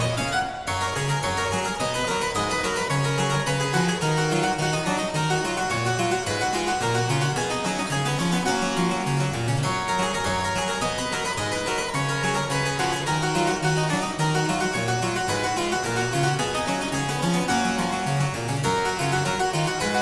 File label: Aria Variations in G Major, Harpsichord